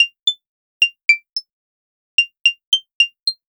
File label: Guitar Based; Eclectic Electronica